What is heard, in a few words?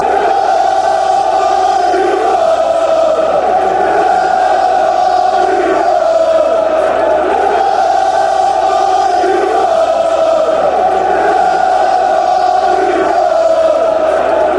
come you, chants; hull